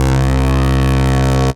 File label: Analog Bass; Freezeone; Ableton; Factory Packs; Retro Synths; Analog Bass Vol 1